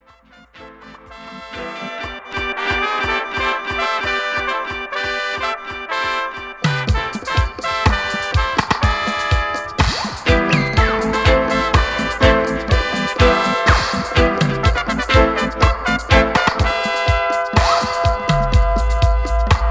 Dub Trumpet

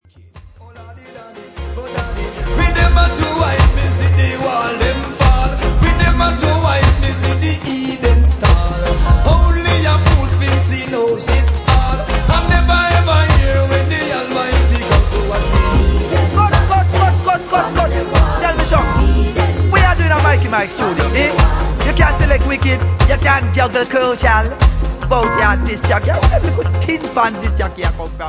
Heathen chant

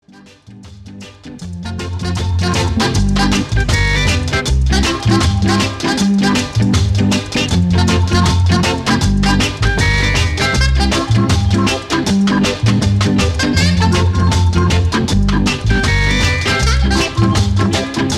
Splash Down (Instrumental)

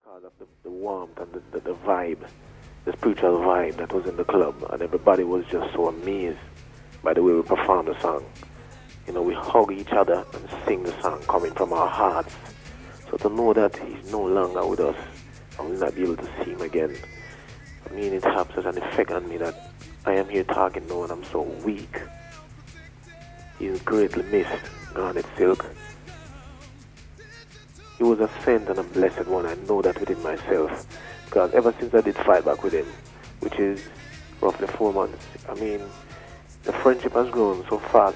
Piano

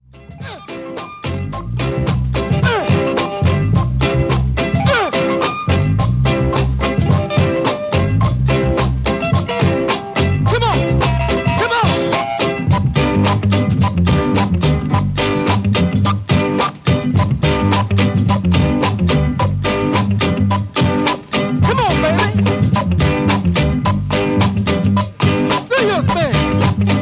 Funky Reggae

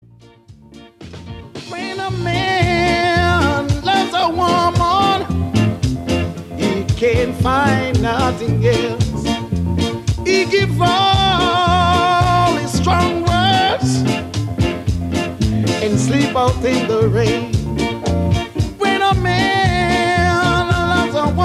Medley

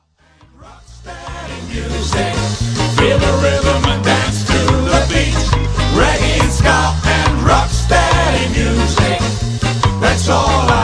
Reggae'n'Ska

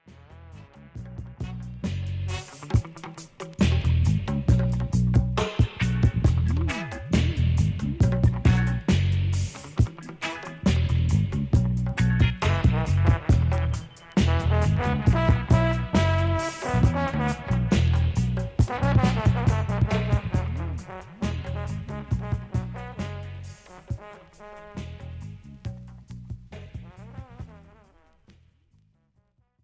Dub Music (Instrumental)

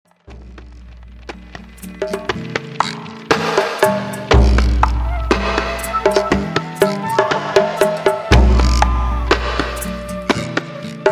Strumming Dub

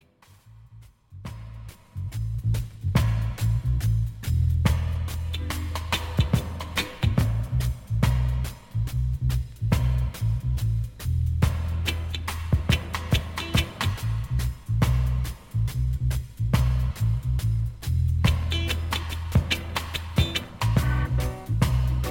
Into Drum & Bass

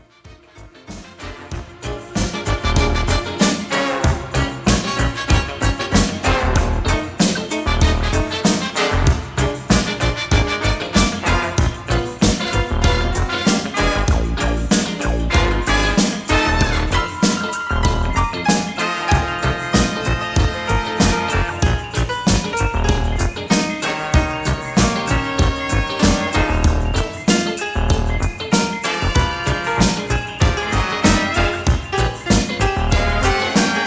Instrumental

